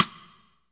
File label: SD RI, SD Rim; Sound & Vision - Gigapack I